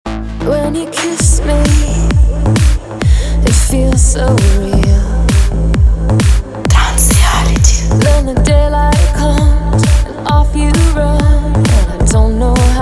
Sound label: ringtone